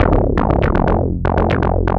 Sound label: Bass Line; Eclectic Electronica